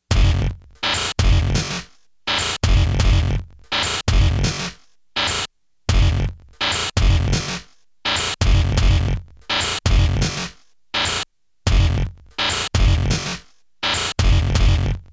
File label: electronic